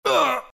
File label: pain zh; Dota 2